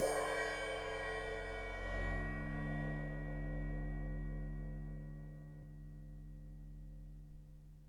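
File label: ride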